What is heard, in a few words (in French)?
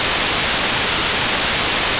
son bruit blanc